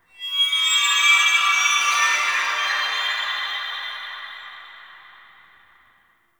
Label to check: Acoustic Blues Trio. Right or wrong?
Wrong